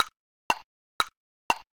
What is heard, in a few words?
Tick Tocks double time 120bpm; Quests